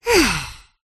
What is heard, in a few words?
cartoon character relieved sigh; zapsplat